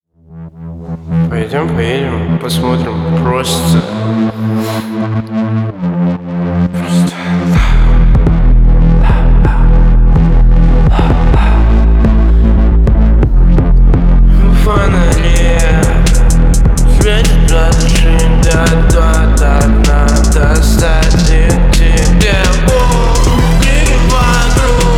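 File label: Noise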